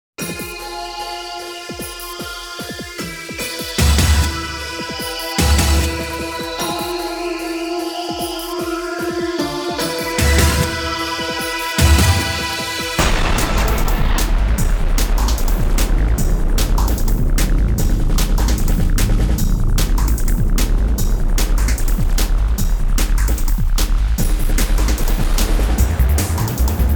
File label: suspense